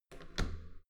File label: metal door heavy handle down soft